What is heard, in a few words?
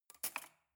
cutlery cake fork drawer place soft